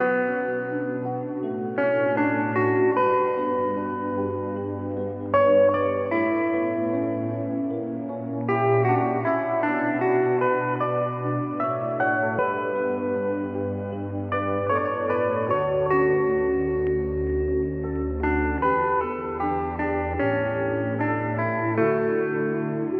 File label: guitar and keys, Sad